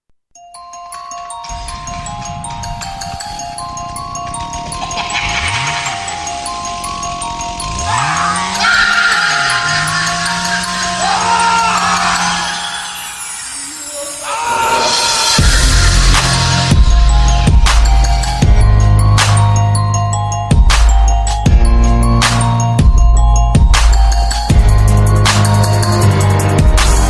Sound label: Horror Bass